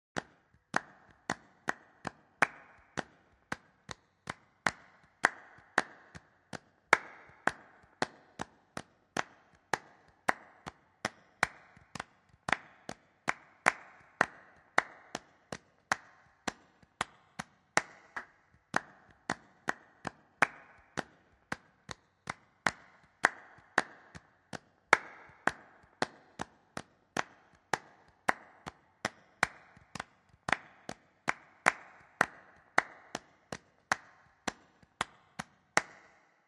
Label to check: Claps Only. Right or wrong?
Right